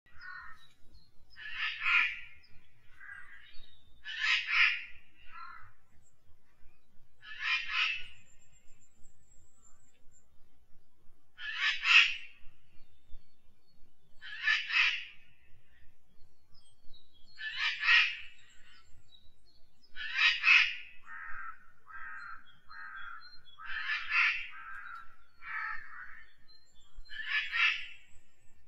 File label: Forest Birds Italy